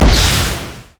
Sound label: r booster activate; LoL; jinx base